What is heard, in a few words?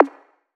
snare; bespoke - Floral v.2